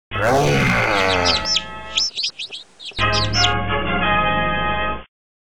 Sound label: yeti finish